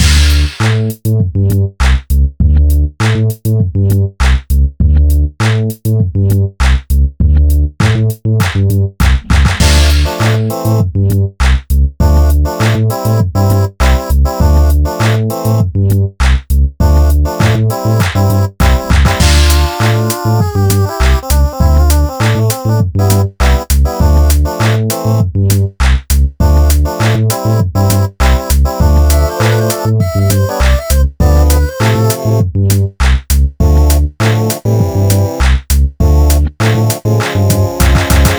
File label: glitch stairs